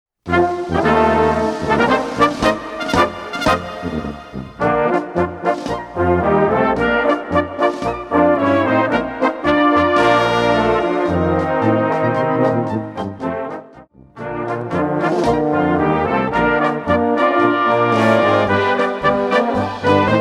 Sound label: Polka